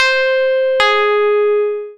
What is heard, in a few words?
Doorbell